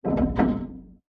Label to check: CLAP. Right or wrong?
Wrong